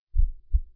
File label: heart Beat